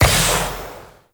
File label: Vial Ability Gas Shatter; Warframe; Warframes; Alchemist; Elements